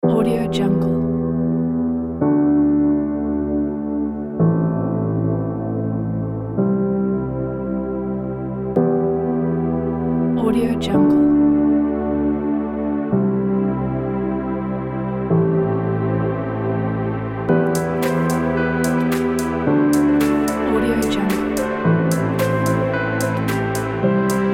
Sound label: inspirational ambient emotion kit